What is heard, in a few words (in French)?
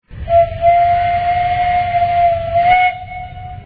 sifflet